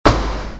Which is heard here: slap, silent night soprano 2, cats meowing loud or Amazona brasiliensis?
slap